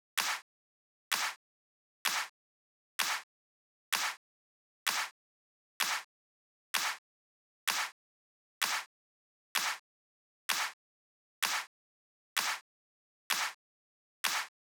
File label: snare, Key E, Bpm 128, Big Room House; Loop Nerds; Big Room House Allstarz